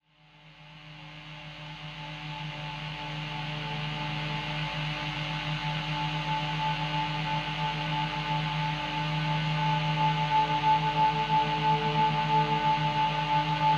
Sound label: guitar pendulum